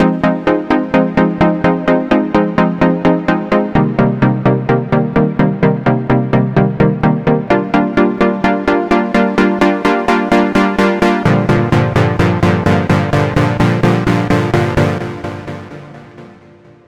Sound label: Synth Main; super crush k.o.